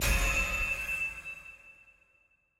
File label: ui click; storyselect